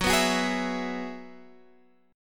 Gb 7th Suspended 2nd Suspended 4th, Guitar Standard; strummed; capo 2; x,9,9,11,9,12